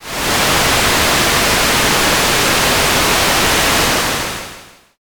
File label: rain